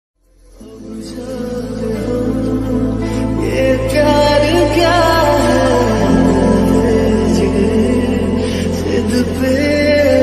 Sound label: mood off ringtone song bgm ringtone